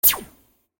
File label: laser gun metallic